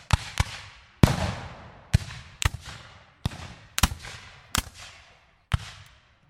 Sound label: Random Musket Fire